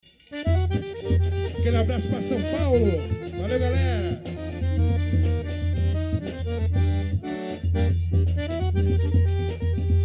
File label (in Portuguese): forró desarmado